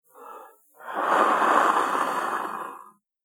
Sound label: Human Noise Man Blowing, breathing; Human Sound Effect